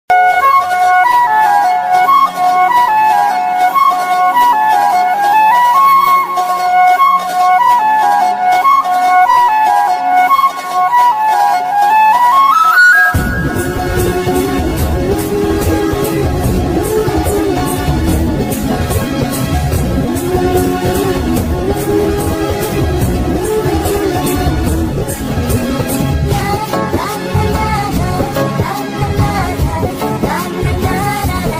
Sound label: bike bgm; polladhavan; dhanush